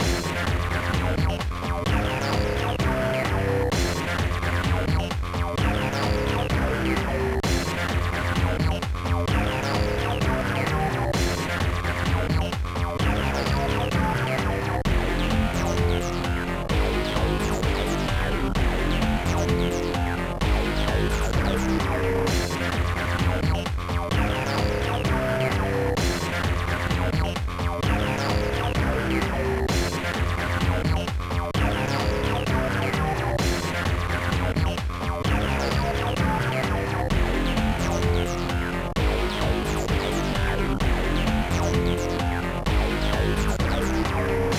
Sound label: Lockpicking